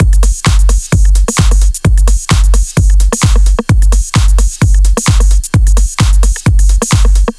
130BPM Kit; Pressure 1 Kit

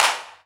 Clap; Vengeance Samples

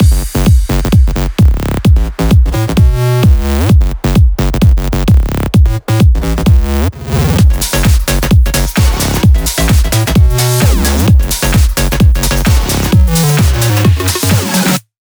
130BPM Mix; VFH1 130BPM Change Kit

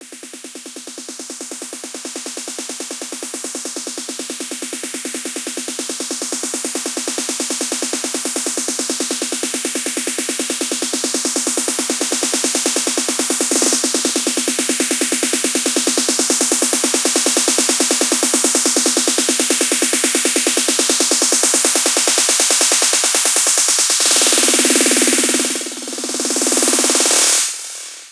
140BPM SNARE 16Bars; Vengeance Samples; Snares&FX Pairs

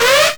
Synths D#; Vengeance Samples

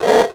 Percussive FX; Vengeance Samples; VEH1 Percussion

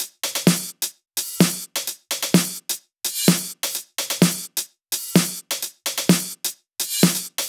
House loops 128BPM; Vengeance Samples

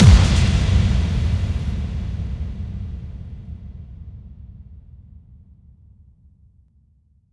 Bassdrums FX, FX Kicks; Vengeance Samples

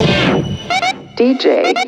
House Loops, Vocal Loops House 128BPM

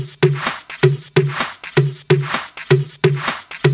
House Loop, 128 BPM; Vengeance Samples

